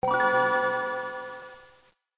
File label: game boy start